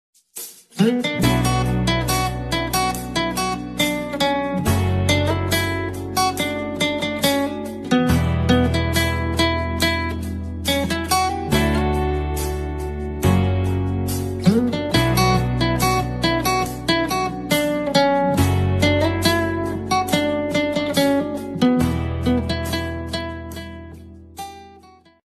instrumental ringtone guitar cover instrumental ringtone